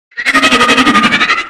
Spider Grunt General Scream; Deep Rock Galactic Boardgame

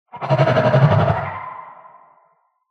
Spider Grunt General Scream Distant; Deep Rock Galactic Boardgame

